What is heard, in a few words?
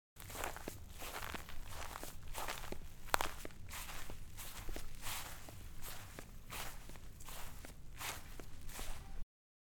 Footsteps On Grass